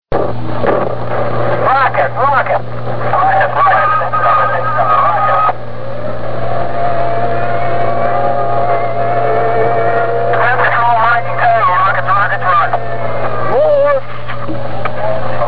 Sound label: complete attack, Rockets Weapons Explosions